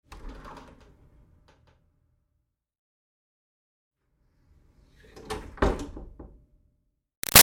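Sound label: Door Wood Office Push Hard Open-Close; Miscellaneous Doors; Sound Effects:Doors; Big Room Sound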